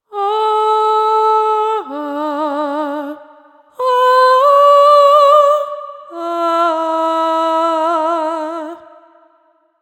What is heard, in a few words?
Shaman Chant; Elden Ring; PS4; Xbox Series X/S; Ancestral Follower Shaman Chant